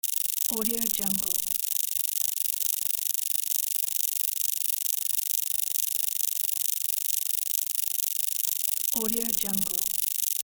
score counter; tineside

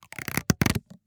balloon squeak creak

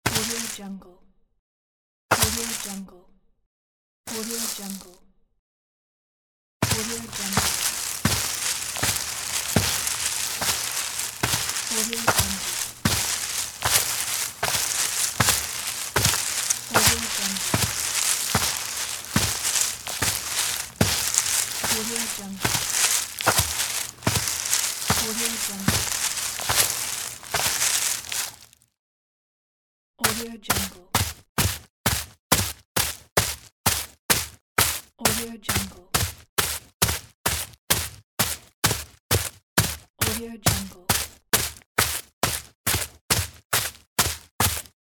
footsteps on grass running and walking

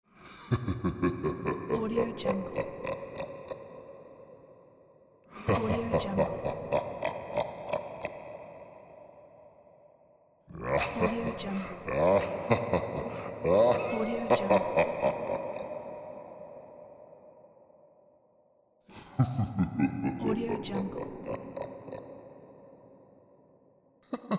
demon laugh

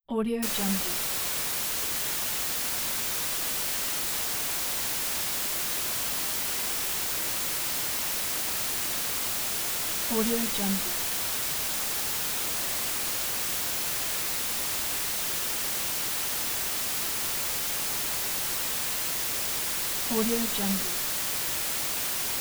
white noise tv static; recfx

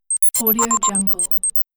hitech ui tweet

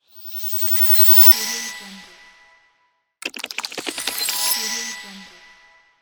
podcast intro logo

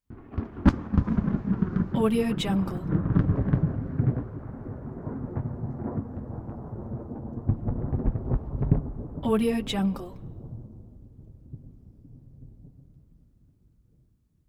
thunder close

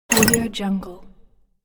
unlock move

